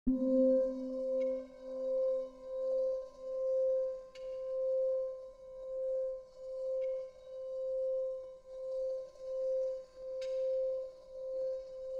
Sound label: ambient